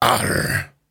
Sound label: Grunts and Chants, Viking Vocal